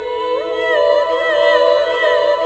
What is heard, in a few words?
Vox Gothic; Multisamples; Proteus 2000; Digital Sound Factory Proteus 2000